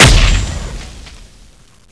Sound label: usp match fire, weapons